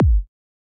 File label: collision; sfx; cosmic protector